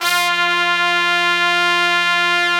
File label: F2, Section Horns